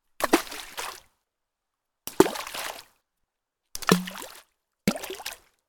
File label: Water Plushes Small